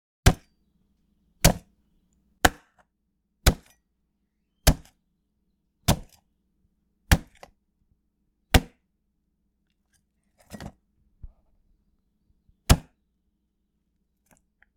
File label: Beefed Up Watermelon Skin Cutting Sounds